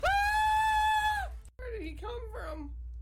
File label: man scream